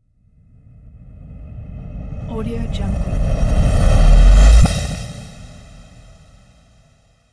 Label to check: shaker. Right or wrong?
Wrong